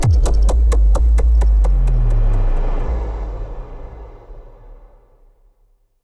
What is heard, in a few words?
Low Cinematic Impact; Robobeat